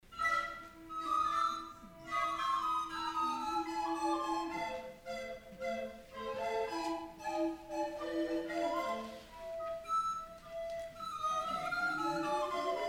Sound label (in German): Floetenchor Tango